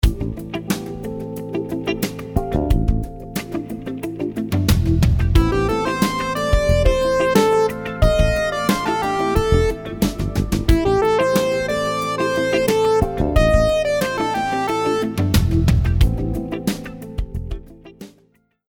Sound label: rhythms 16s syncopated